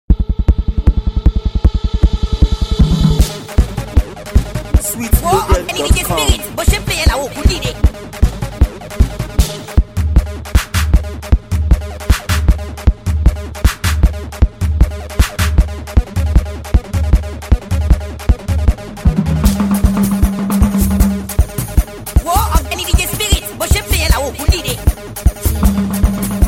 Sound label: Beat